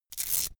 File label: velcro open cap quick